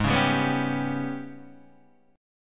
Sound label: G sharp Minor Major 7th Double Flat 5th; G#mM7bb5; {4 4 5 4 2 3} strummed